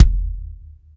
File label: BD, Bassdrums, Roland TD-20